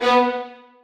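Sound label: 2nd violins staccato a#3; Sonatina Symphonic Orchestra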